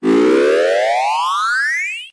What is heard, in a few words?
cartoon grow expand rise